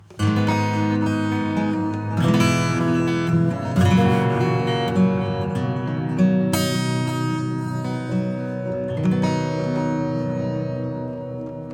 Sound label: Acoustic Guitar Hall; Quarter Glistening Guitar Hall Drk